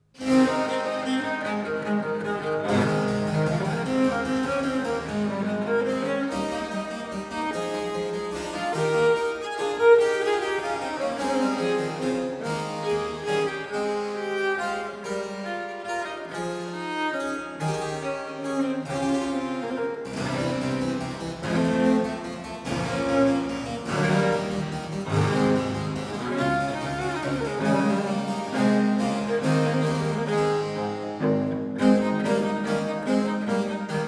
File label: Suite in A Major Boutade; Marais; Livre II